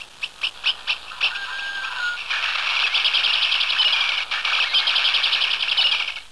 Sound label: blackbird; Chris Marker - Immemory